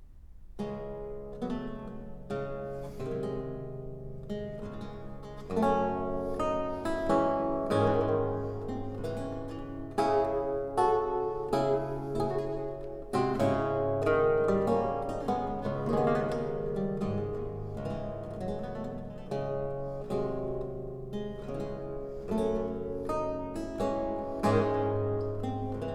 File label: Sarabanda, Lute Music